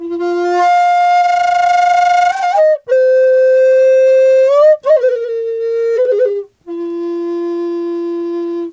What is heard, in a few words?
F wild flute; Big Fish Audio - Didgeridoo and Other Primitive Instruments